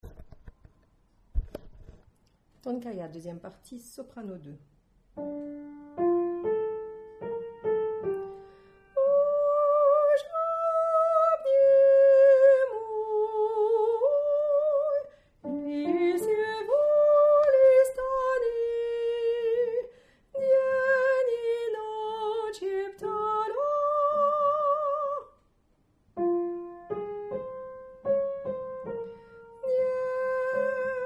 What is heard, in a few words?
Soprano